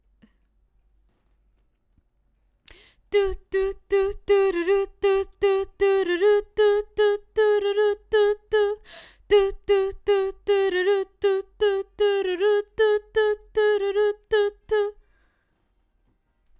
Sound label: BG Vox